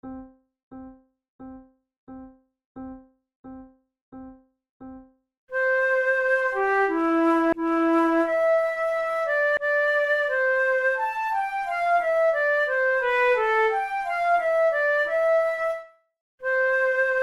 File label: duet, flute